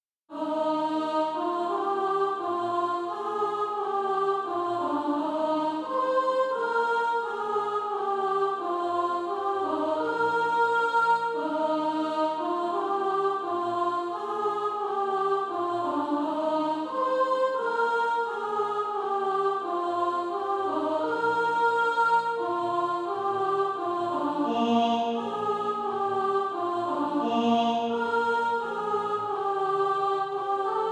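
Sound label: sop, Practise Music; SATB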